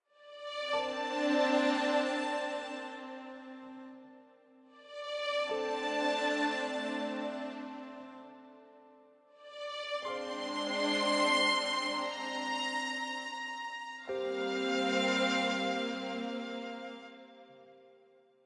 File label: Rain Transform; Sky Children of the Light gamerip